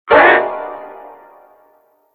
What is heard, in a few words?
grunt; high roller